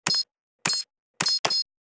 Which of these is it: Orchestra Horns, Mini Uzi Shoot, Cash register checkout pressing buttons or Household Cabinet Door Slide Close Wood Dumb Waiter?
Cash register checkout pressing buttons